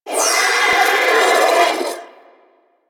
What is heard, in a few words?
Sentient Symbilyst Death; Enemies; Barks